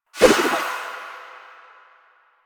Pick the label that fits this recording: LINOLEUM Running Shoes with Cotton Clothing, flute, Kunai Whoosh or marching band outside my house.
Kunai Whoosh